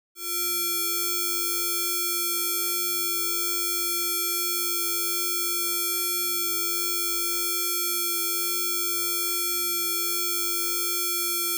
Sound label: Multitone signal, 1kHz spacing; Stereophile Test CD, Vol 3